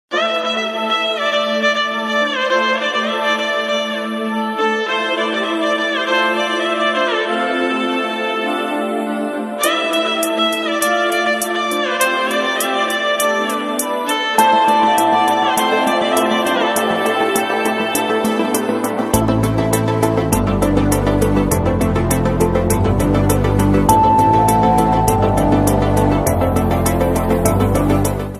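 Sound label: background music guitar ringtone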